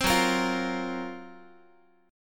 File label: Gb Major 7th Flat 5th Guitar